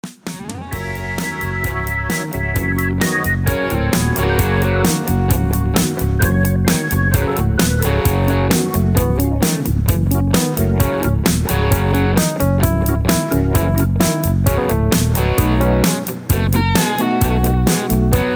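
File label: with melody and background vocals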